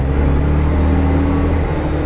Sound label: VW bug, 汽车声音; 汽车声音素材